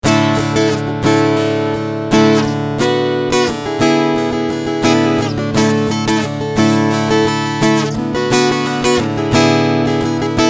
Strumming Preamp

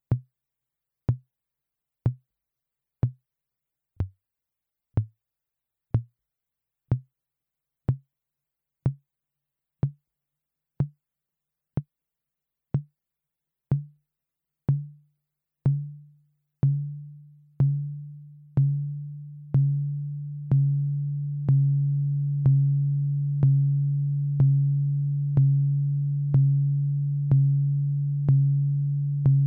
MFB Tanzbaer LowTomConga

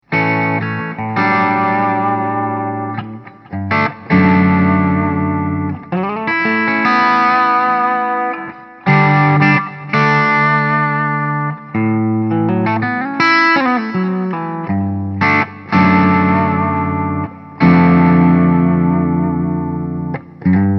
Tubeamp Send out Overdrive

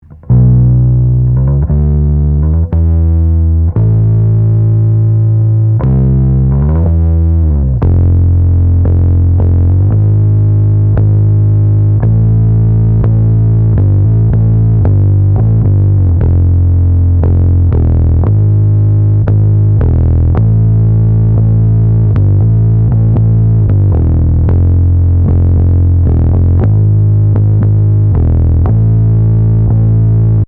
UA1176LN Hoefner Bass Ampeg U87

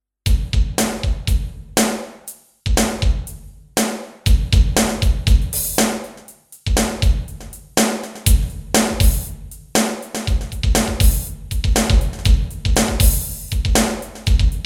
Groove

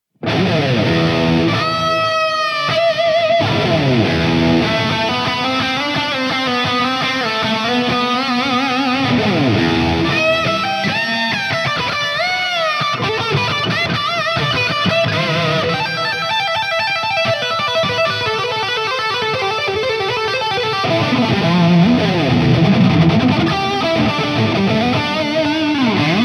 Lead Wide; ToneMasterPro

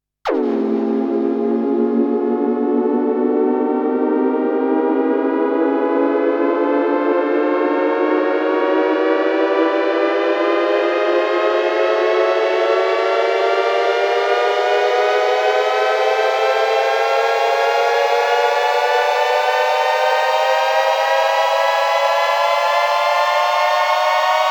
ASM Hydrasynth Poly Riser

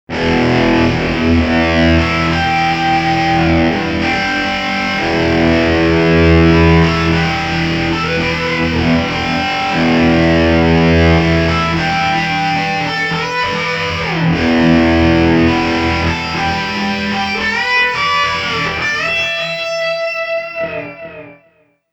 Delay Fixed Flanger; Soldano

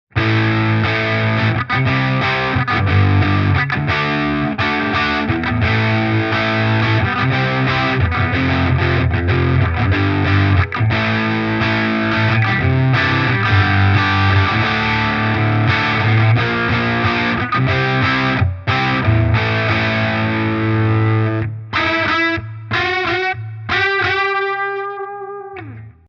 12 h Bright Switch off; JHS Pedals Berkeley Bite

